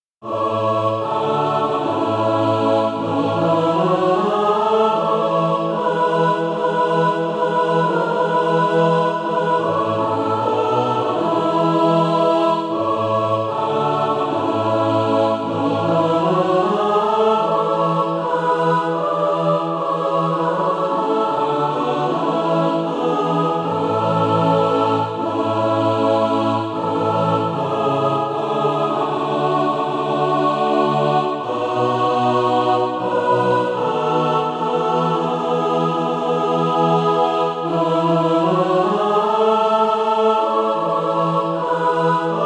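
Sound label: mixed, SATB Practice Music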